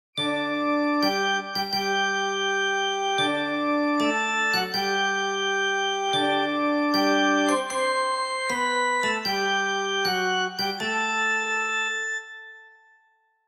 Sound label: Narrative Music Pop Quiz